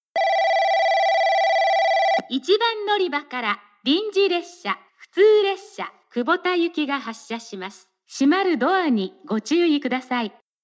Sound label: balloon